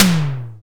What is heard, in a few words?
SDS5 TOM; Greytsounds VOL-1; SDX TOMS